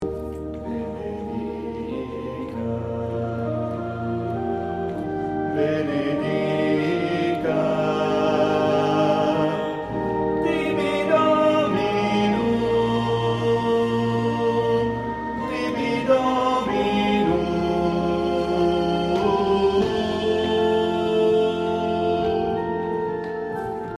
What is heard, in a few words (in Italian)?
Ritornello Sop Ten; Benedicat tibi Dominus